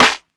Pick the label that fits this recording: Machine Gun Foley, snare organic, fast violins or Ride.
snare organic